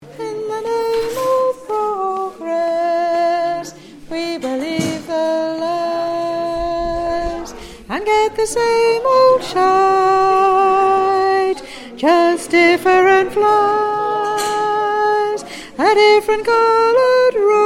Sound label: we got fooled again Sop